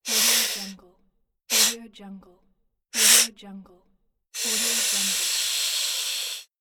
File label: man nose sniff